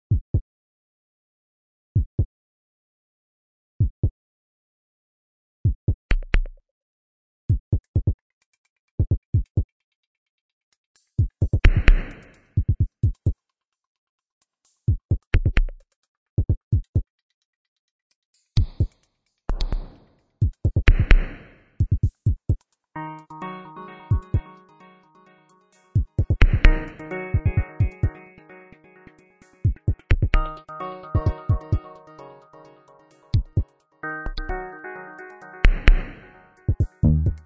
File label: heartbeat Afro